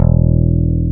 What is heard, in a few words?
DKPBASSPH E1; Sonic Reality; American Heartland